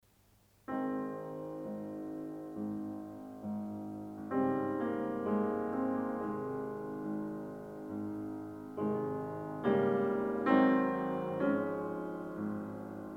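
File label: Piano Sonata No. 13 in E flat major; Wilhelm Kempff; Beethoven; Piano Sonatas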